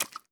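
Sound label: bullet case bounce 338 mud; gmod; viper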